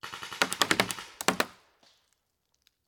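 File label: Paintball Gun Impact; Sound Ideas; Guns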